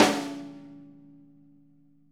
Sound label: snare, Interactive Drum Kits; Sonic Reality